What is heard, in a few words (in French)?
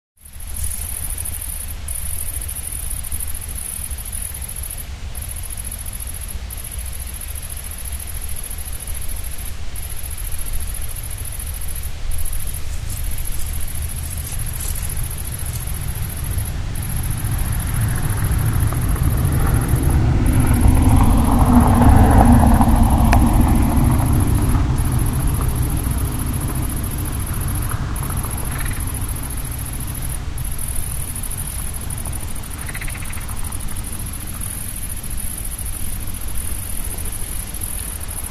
vent